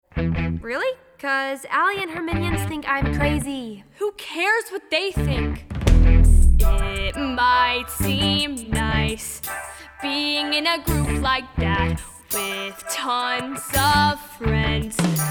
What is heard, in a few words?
Guide Vocals; Audition Cut